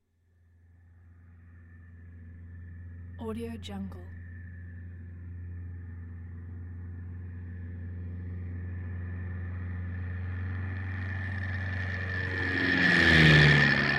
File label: prop plane pass